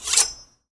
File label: Machete